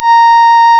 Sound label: REED A#; Big Fish Audio; Synclav FM